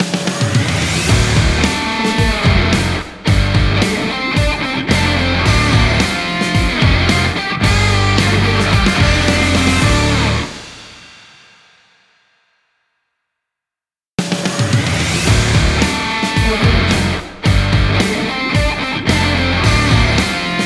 action sport rock logo; wolf-music

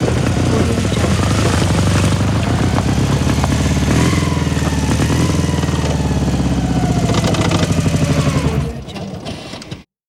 motorcycle engine start and stop

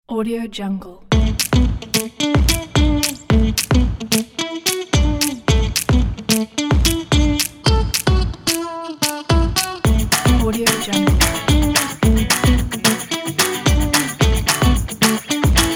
happy upbeat positive folk

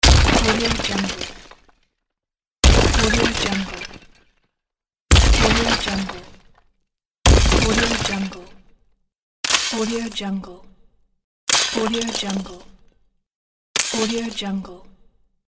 crashing ice; creatorium-sound-design

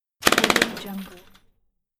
quick machine gun burst and brass falling; kilmermedia

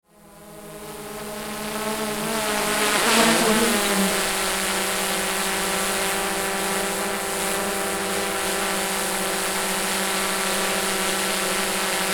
big drone fly

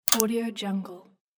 a snap

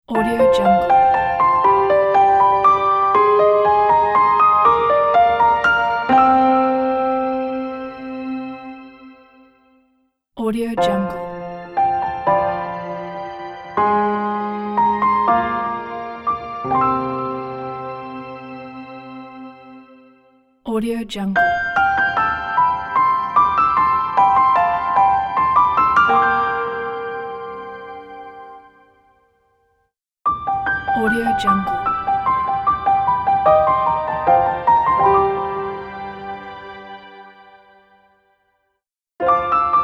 clear piano logo; clear piano logo pack

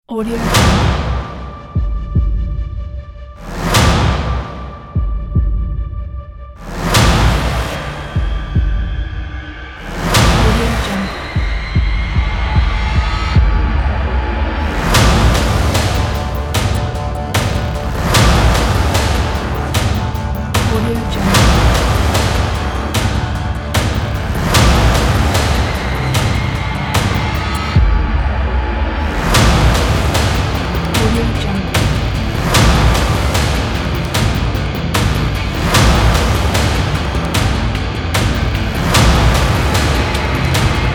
epic trailer

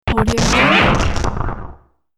futuristic static robot

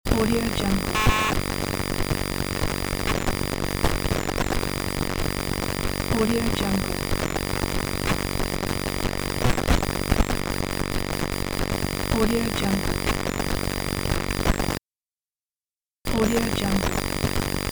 static interference noise

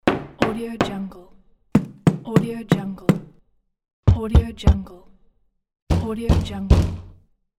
knocking

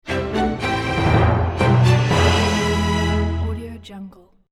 epic news logo; drtimwaters

